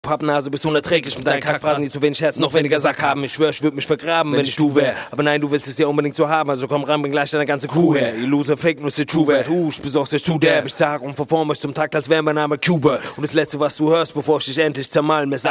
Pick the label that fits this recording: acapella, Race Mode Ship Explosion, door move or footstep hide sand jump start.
acapella